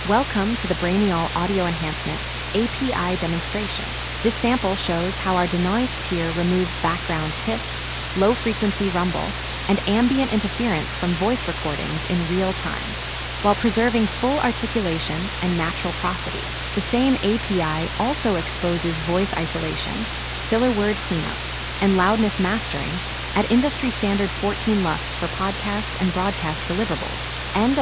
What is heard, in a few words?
noisy